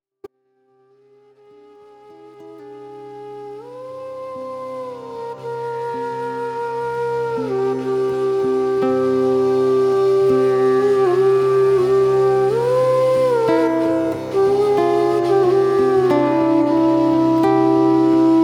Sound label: Morning Meditation